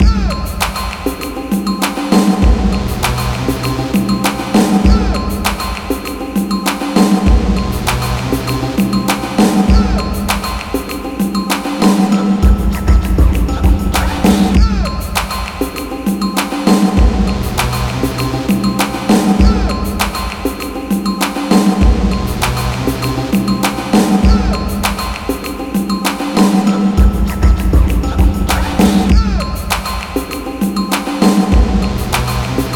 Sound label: Beat; NBA Live 2005; GC